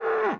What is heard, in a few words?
cow hit